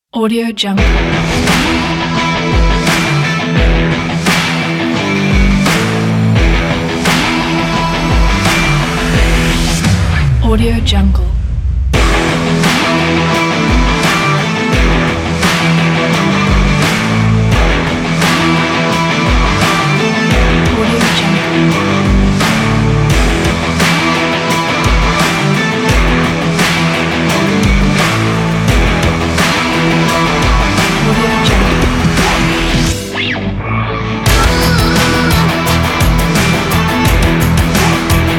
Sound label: hip hop and rock cinematic trailer